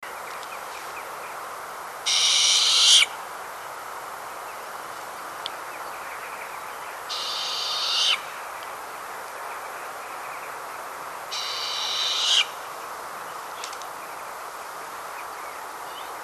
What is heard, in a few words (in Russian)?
bolotnaya sova golos